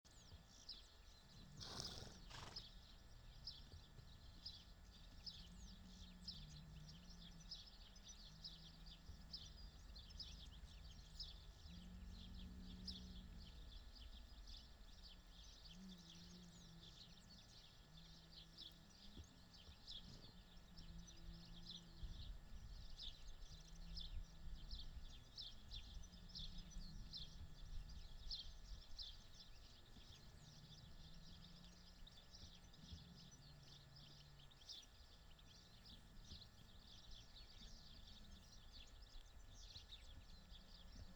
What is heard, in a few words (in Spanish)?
FINCA DE LAS TIESAS; VICTORINO MARTIN